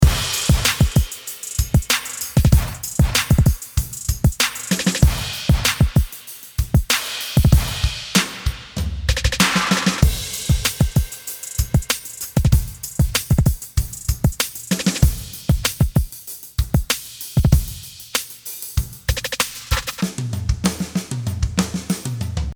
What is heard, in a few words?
Chicago Drums; AtomicTransient